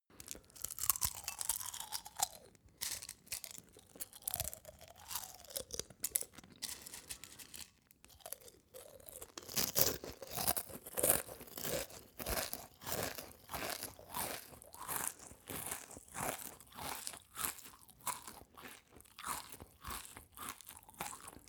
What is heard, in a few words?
male eat cereals full